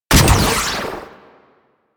Heat Gun Shot; Grineer

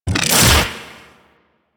Leap Whoosh; Enemies; Abilities; Warframe; Corpus; Arachnoid Hunger

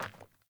Sandals Walk Metal; Characters; Player

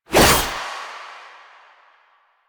Skana Whoosh; Melee; 1999

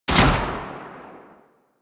Rifle Shot Alt C; Sundial; Warframe; Tenno

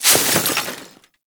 Melee Impact Snow; Warframe; Weapons

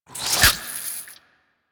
Infested Burst Rifle Reload; Warframe

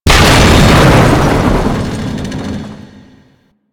Hull Explosion; Warframe; Explosions

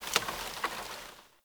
Day Branch Crack Small; Ambience; One Offs; Glades Of Andurin

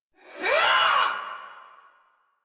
Attack Effort; Warframe; Dax; Ranged

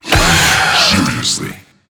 Infested Lich Pain, Barks; Warframe

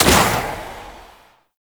Shot, Pistol; Warframe; Weapons; Tenno; Tenno Priest Pistol; Knell Prime

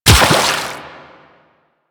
Run Water, Footsteps; Warframe; The New War; Kahl; Quests

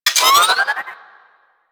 Space Port Cargo Scanned Beep; Venus; Objective Rooms

